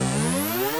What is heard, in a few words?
power up sound; Space Shooter